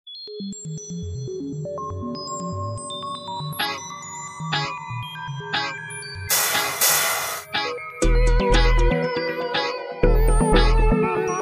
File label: ghost beat